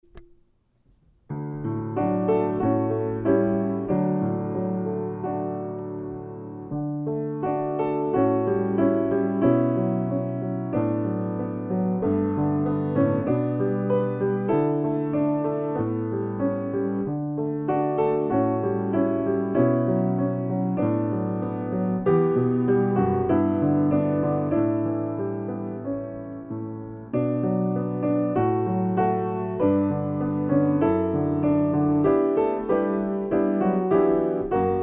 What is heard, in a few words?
Piano Accompaniment